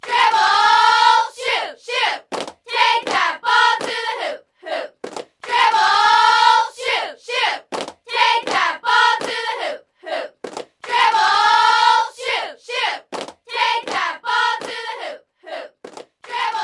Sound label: Sfx Basketball